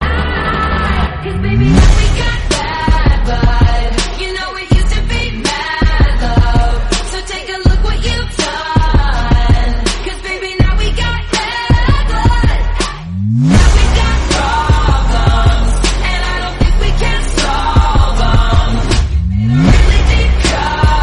remix

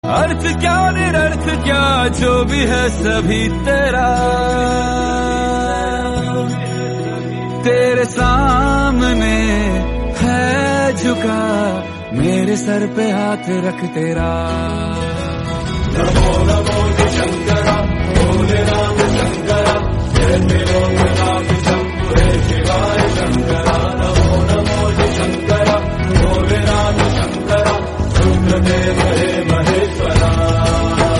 devotional, hindi

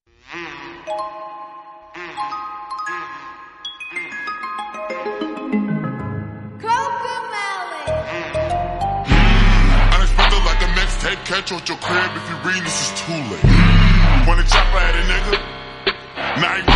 remix bgm; cocomelon

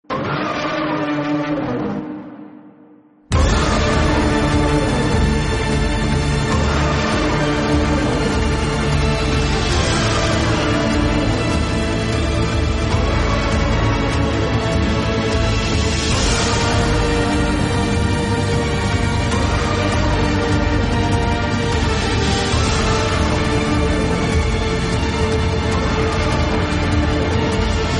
background music

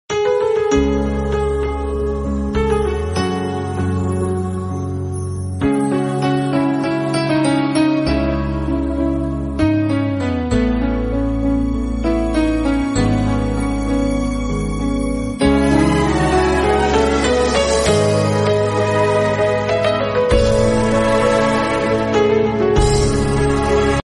instrumental piano bgm

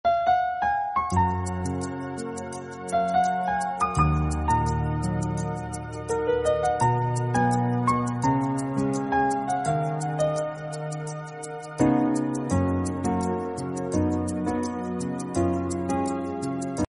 bansi bgm instrumental; surmukhi

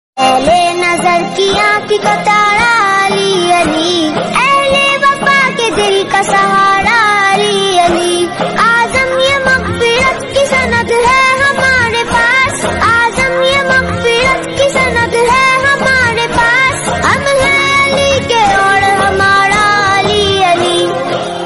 islamic song

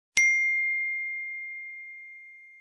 apple notification ting

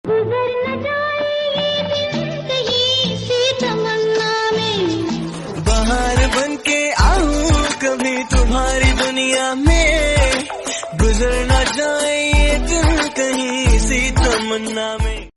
hindi song

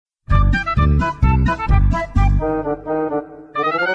theme music; Shinchan

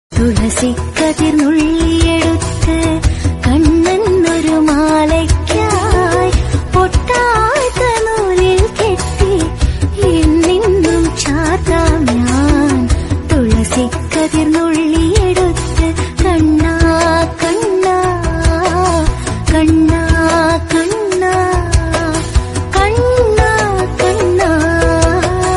krishna song bgm

